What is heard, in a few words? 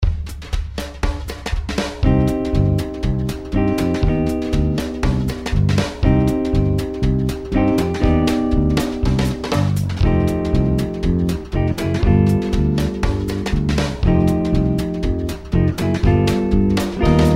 Jazz Guitar For Beginners, guitar; Lesson 10